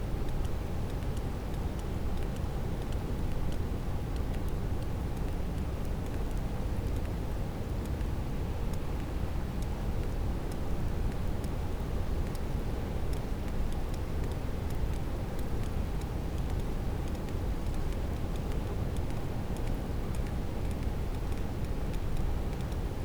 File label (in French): cachalot positif, Physeter Macrocephalus